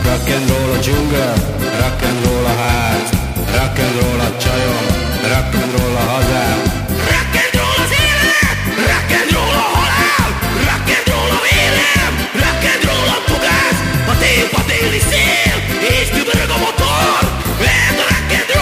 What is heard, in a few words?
rock